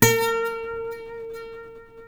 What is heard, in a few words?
B 4 MARTIN 6, Guitars